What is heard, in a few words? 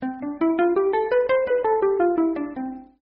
Guitar Scale